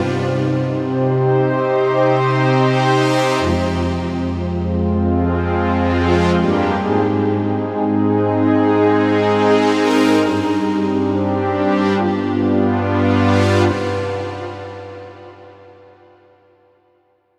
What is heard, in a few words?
Modulation Pad; Dr. Fink's Funk Factory